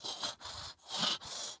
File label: Striker Breath Walk